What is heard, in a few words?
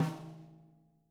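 snare on mp, Living Drums; JZ XTOM 1; Sampleheads; Peter Erskine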